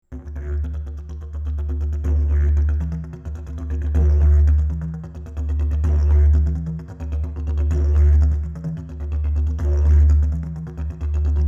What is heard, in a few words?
didge